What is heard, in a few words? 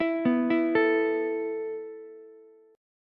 A Suspended 2nd A down Ukulele; Asus2 arpeggiated